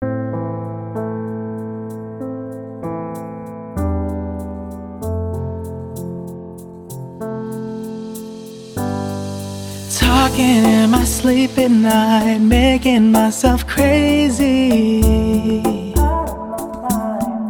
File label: Rumba 24 bpm; Korotkoff